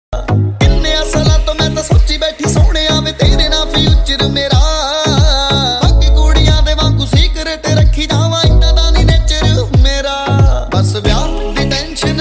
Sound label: punjabi ringtone; bollywood